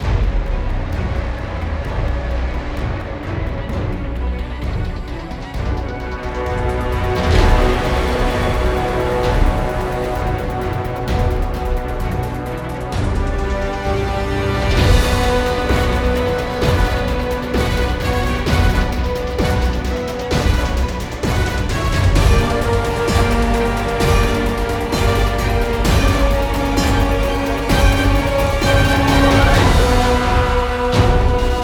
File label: Battle Dark Epic; Waves Common Battle 03 Dark Epic